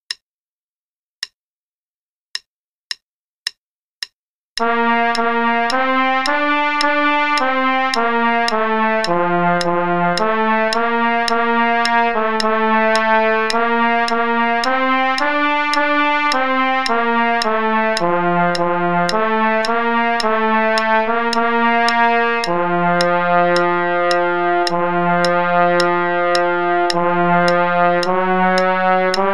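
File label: Play-a-long, quarter note = 108, trumpet; Ode To Joy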